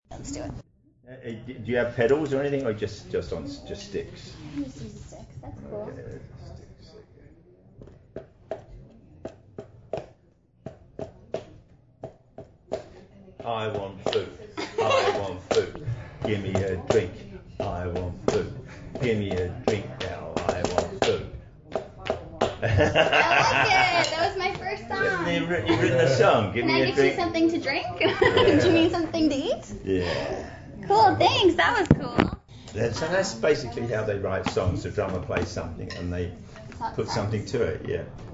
on the drums

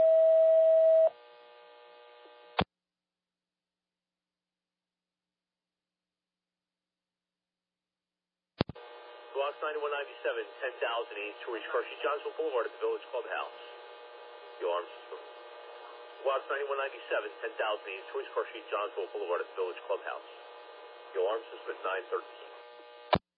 alert page; warminster

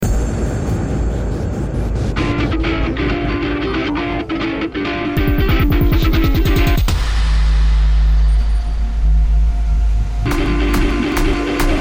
kitten wub machine remix

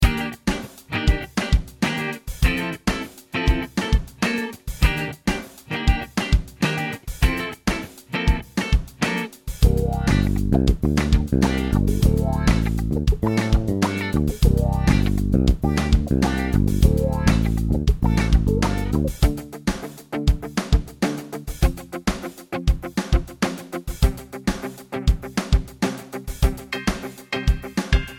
16th note drum groove

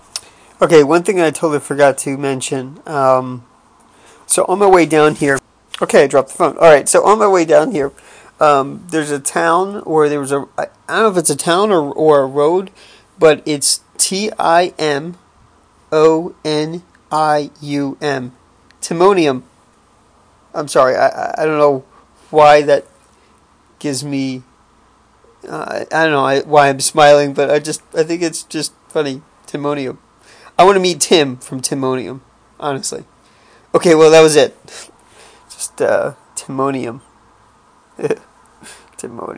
snicker

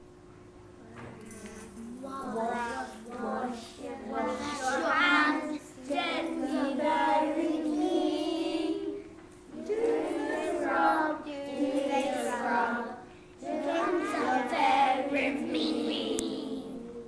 reception health song

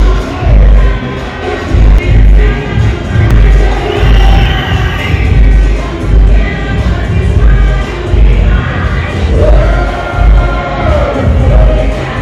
sound of Notting Hill carnival

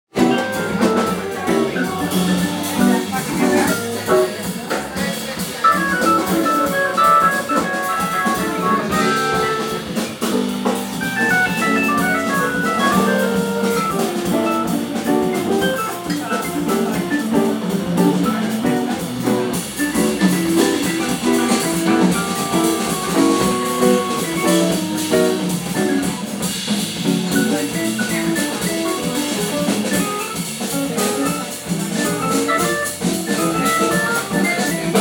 Hammond organ night; j j smyths

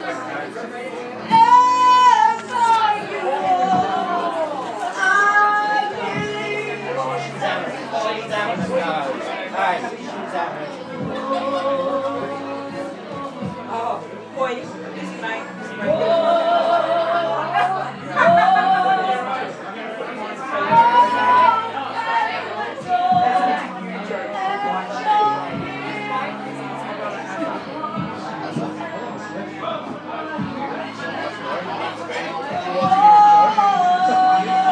karaoke

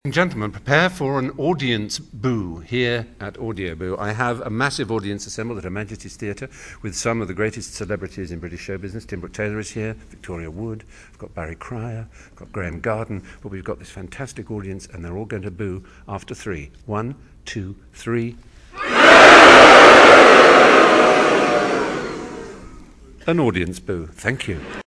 audience

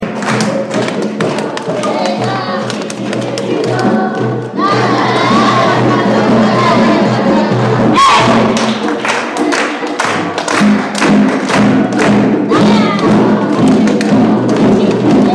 school choir

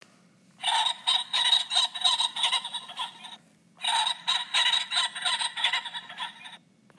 ring necked pheasant

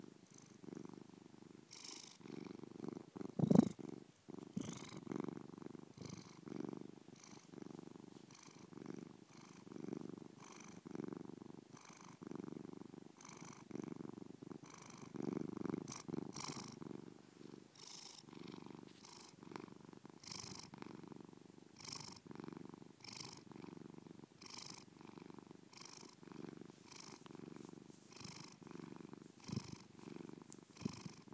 another bloody purring cat

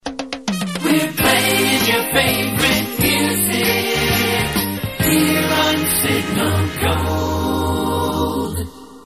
ident; signal gold jam

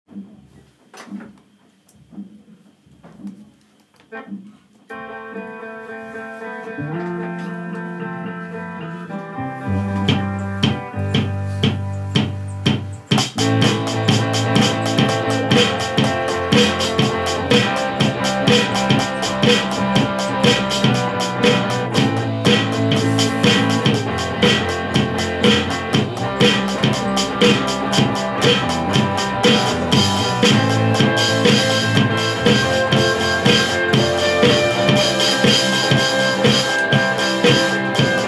drums live

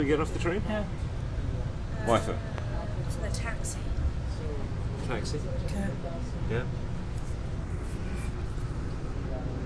taxi

